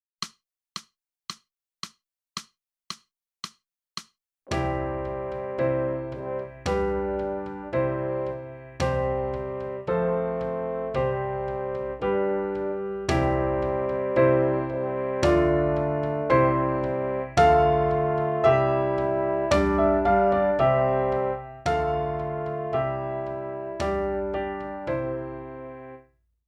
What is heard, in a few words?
sax